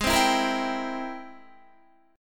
G sharp Augmented Major 9th G sharp Guitar; G#+M9 strummed; JGuitar

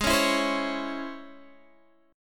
C sharp Minor Major 7th, chord

